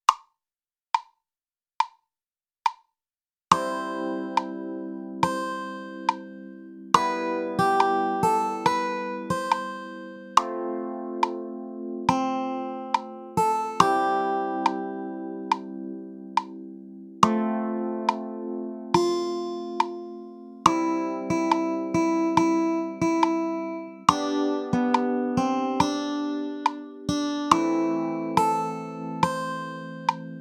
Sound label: avec melodie